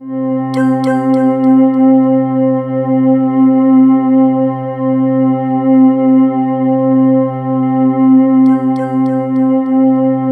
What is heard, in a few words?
C5, Synth Leads